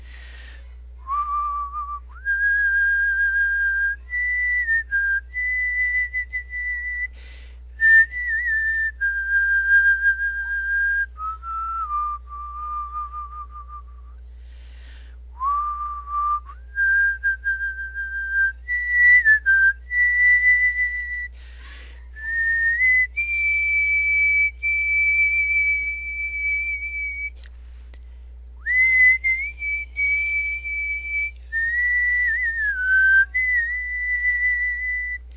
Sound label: Whistling